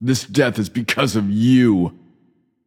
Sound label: death; smite; raijin